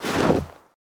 snow step; Golden Crayon Sounds

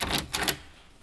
door open; Golden Crayon Sounds

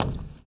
roof step; Golden Crayon Sounds

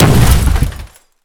grenade hit vehicle; Original TK Sounds

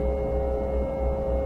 fridge; Original TK Sounds

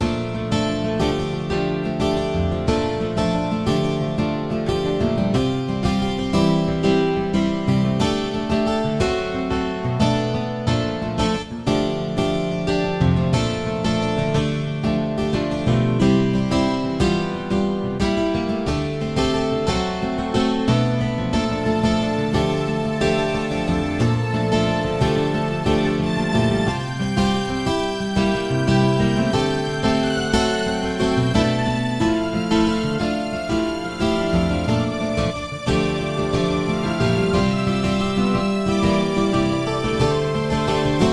day; RS Games Sounds; Werewolf

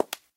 marble step; Golden Crayon Sounds

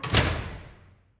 door close; Golden Crayon Sounds

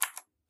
keyboard type; Golden Crayon Sounds

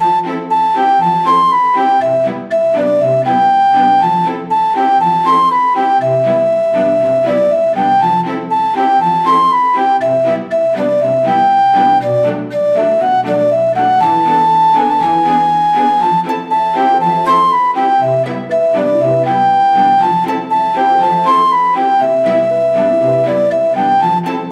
village; tradale; Manamon Music; Manamon 2

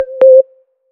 Call Reconnected Loud; Skype Sounds

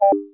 Braille Display Connected, VoiceOver Sounds

